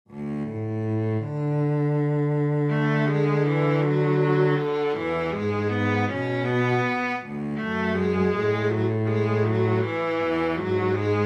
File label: Cello Baritone; Tenant Recit